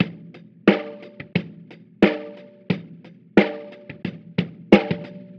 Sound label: 089 Chill Pop No Treble Drums; Pop Rock; Moderate Tempo; Pro Tools Essential Loops; Digidesign